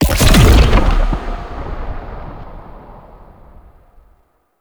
Hazard Fracture Disable; Warframe; Duviri; Undercroft Hazards

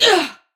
Pain; Warframe; Tau; Dax; Ninja

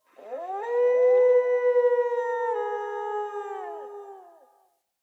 Wolf Cub Trapped Call Distant; Animals; Wolf Cubs; Orengal Wolf Cub C

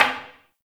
Single Wooden Impact

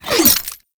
Pistol Projectile Pass By; Weapons; Warframe; Tenno; Temple Signature

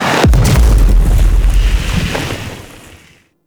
Weapon Explosion; Enemies; Abilities; Ethereal; Warframe; Zariman; Void Angel

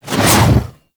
Scythe Whoosh; Warframe; Weapons; Stalker Scythe

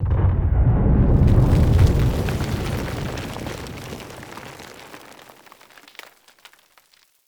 Battle Ambient Tunnel Rumble; Warframe; Tau

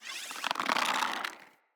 Hunter Bow Ranged Charge Variant; Weapons; Player; Bows; EnvoyHunterBowRangedMelee

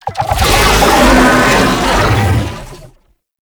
Infested Oni Vocal Idle; Warframe

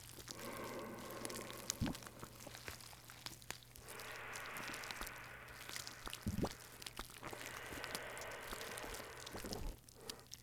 Fungus Loop; Infested

